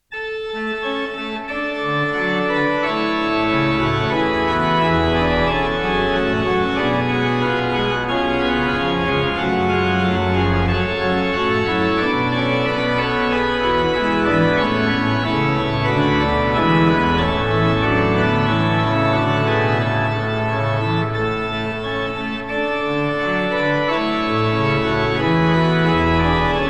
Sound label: organ, choral preludes; Herr Christ, der ein'ge Gottes-Sohn; Bach; Bach Organ Works